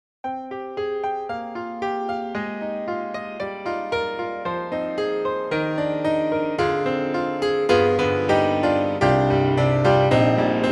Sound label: Imperial Grand Bright Grand; IK Multimedia; SampleTank3